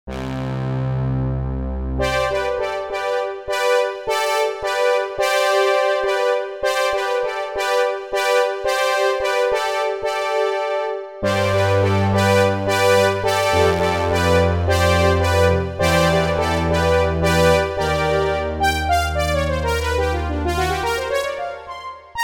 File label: Poly 3osc SH; Roland SRX-07 Ultimate Keys